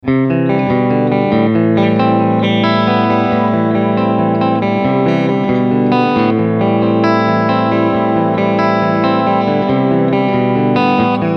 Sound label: Roxane Racing 1500 Singlecoils; lag